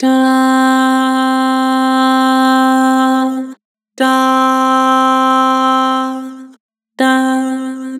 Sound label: "Dah", Vocals; Harmor